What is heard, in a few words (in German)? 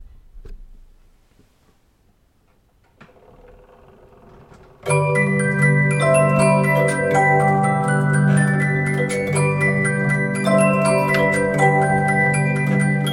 Stille Nacht, Polyphon 40cm; Spieluhren